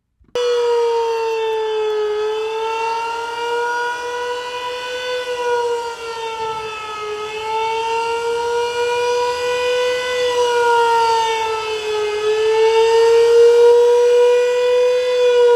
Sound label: fire rescue siren